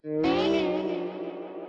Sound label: Casse Feedback Music; Puddle Plus